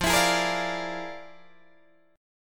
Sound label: F Minor Major 13th, Guitar, DADGAD